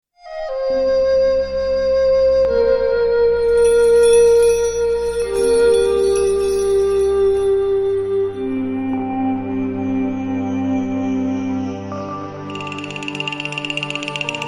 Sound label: Loop; crime stories from the files of martin myst re